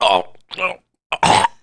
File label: LAST BREATH; Messiah; SCI